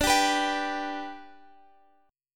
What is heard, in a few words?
B-Minor Sharp 5th